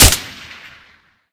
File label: vsk silencer, weapon; metrocraft